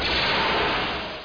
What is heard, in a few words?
Enemy Fir